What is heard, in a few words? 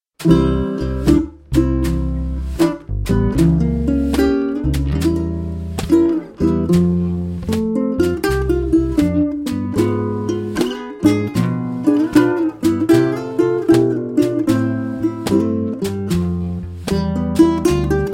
Ukulele